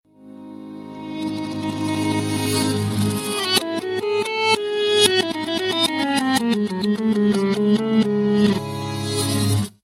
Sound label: exotic reversed guitar